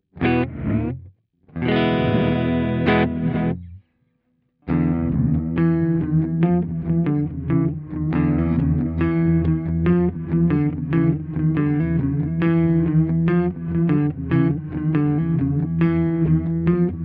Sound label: Keeley Realizer Reverb Reverse